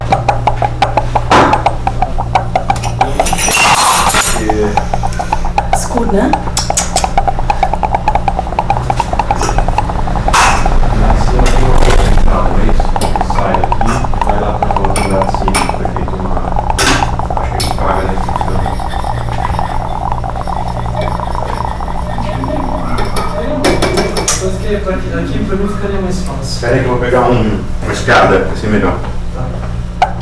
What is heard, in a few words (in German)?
Glas in Der Spuele; Brasil (O-Ton); Fieldrecordings